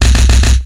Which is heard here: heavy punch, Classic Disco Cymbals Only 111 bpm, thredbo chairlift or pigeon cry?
heavy punch